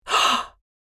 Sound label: Mature woman gasp shock surprise